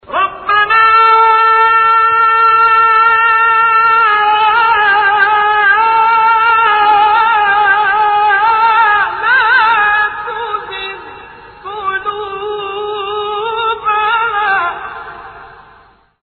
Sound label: Ringtone